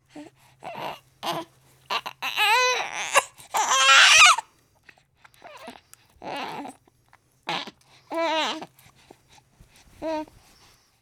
Baby cry grunts

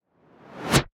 Fast Whoosh

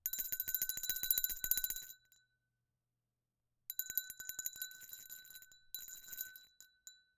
Bell

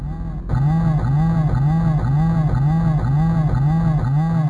Vrr Vrr

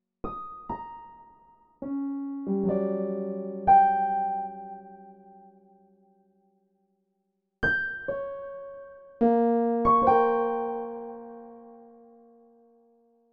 Piano

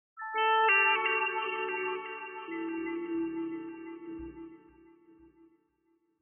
Guitar Swell

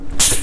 Compression

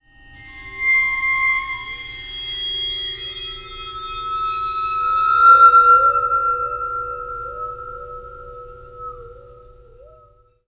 Waterphone

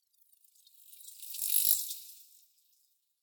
Drippy

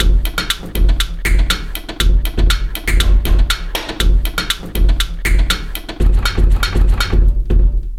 Binaural Beat

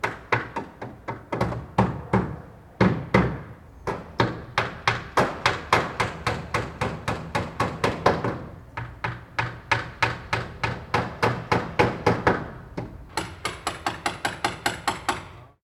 Distant Hammering On Ladder

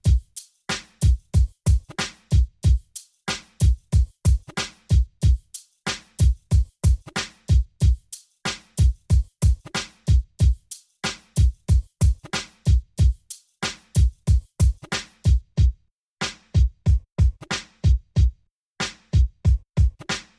DJ drum loop

